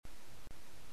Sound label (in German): piano in der wueste; dradio